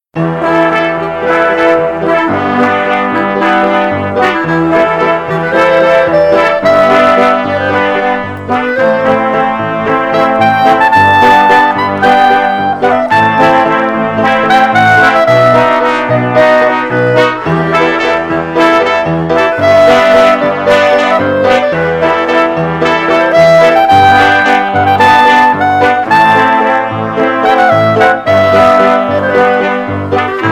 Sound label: rocket jump waltz